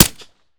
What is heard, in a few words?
fire sup, glock; Urban-Coalition; Decay; arccw ud